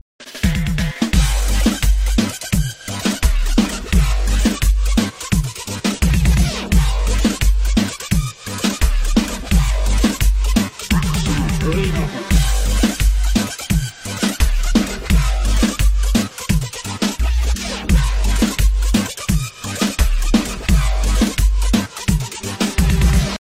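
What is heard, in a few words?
Reggaeton Dubstep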